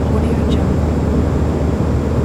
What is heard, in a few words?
airplane in flight engine ambience loop; inspectorj